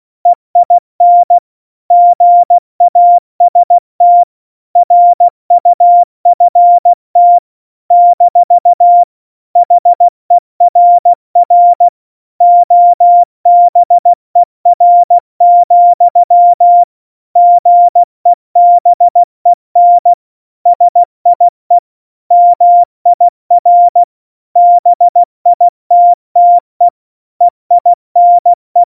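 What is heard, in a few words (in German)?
Witz, Morsekurs, cw morsen; Witze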